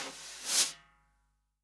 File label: SD, REAL DRUMS, SWING KIT